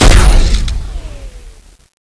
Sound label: plasma gun